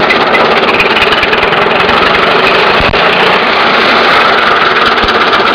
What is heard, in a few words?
old rickety car